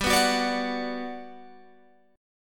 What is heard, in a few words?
G Major 7th, G, down, Guitar, Open G